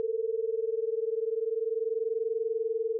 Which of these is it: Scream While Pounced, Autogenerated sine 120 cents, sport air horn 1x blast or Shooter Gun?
Autogenerated sine 120 cents